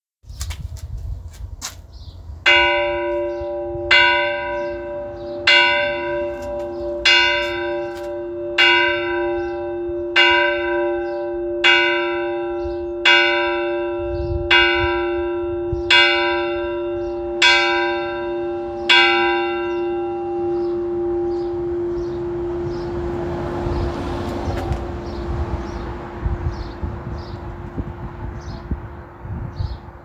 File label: bell, anzac day; westown